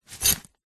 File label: foley tent pegs in small fabric pouch set down or catch; zapsplat